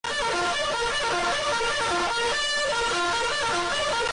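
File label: dog alarm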